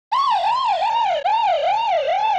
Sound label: Doppler